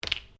Carton cardboard cap plastic place bench, milk or juice container; Food; Household